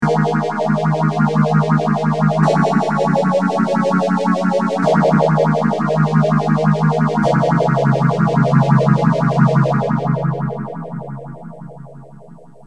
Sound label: m1000 boss ph1r